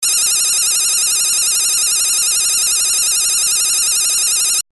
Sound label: alarm